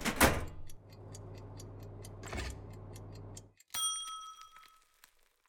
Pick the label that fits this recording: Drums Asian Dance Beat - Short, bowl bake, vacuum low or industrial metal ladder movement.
bowl bake